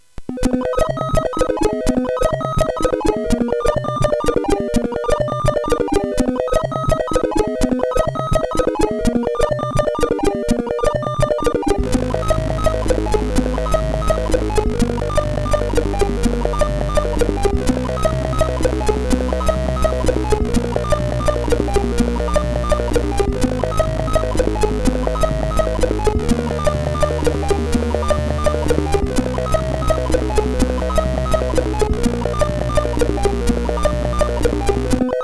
sid CSG8580; Sadness; hvsc

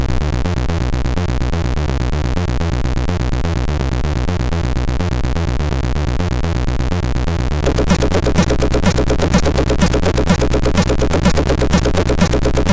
Techbeat sid MOS6581R2

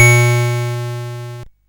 Synth Sample, CSG8580R5

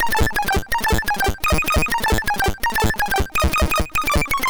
Arcade, sid MOS6581R2; Commando

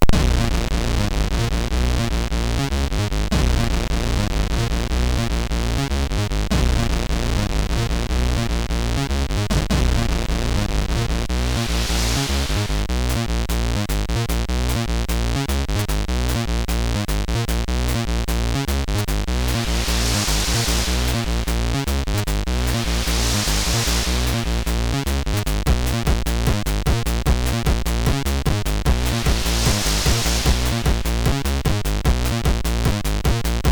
Acid, sid MOS6581R3

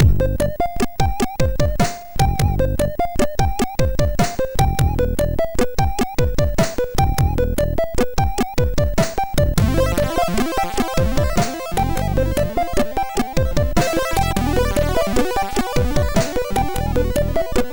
Boogie Funk, MOS6581R2

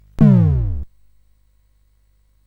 Dark Castle, CSG8580R5

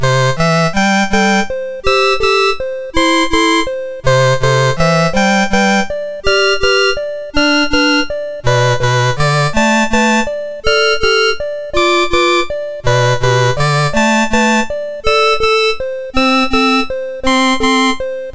Blue Danube Waltz, MOS6581R2; Sebastian Hill

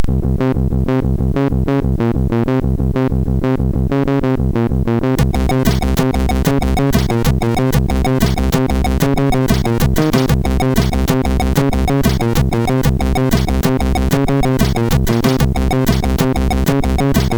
sid MOS6581R4; hvsc

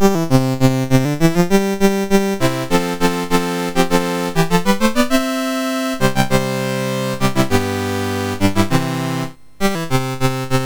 Dixie Land, MOS6581R4

